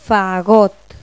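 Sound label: bassoon; Pipo-Aprende musica con Pipo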